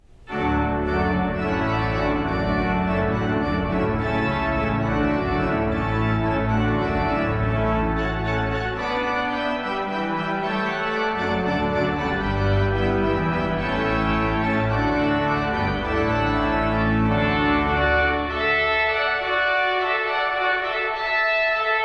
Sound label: Allegro Maestoso Water Music; Handel